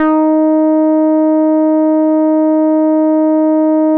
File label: Boom da Bass; E-MU Producer Series Vol. 6 – Analogia Project